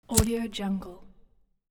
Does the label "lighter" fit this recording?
Yes